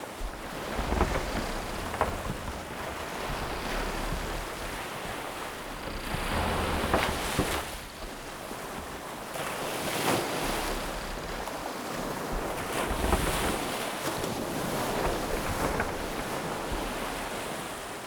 fishboat movement loop; ships siege

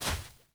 fs snow, singlesteps

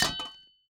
mine metal

